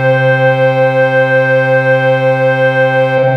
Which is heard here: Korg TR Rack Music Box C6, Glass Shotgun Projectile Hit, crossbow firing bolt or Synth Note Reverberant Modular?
Synth Note Reverberant Modular